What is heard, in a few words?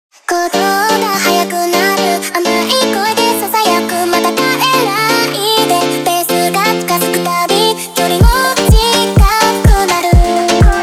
Montagem